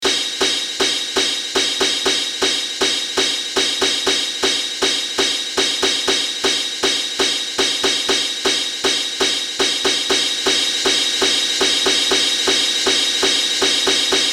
CRASH

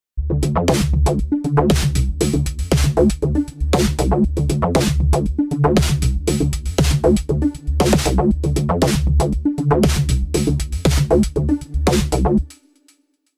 digitone

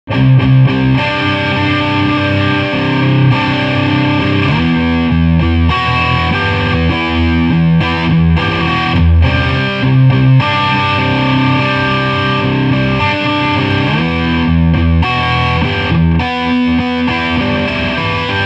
High Gain

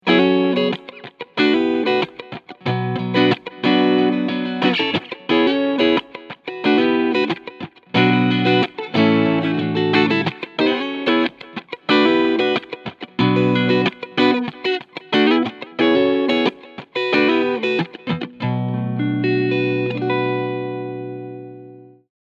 Sabre Clean Neck PU Singlecoil; Sterling by Music Man

